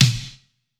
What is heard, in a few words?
pop snare; japanese pop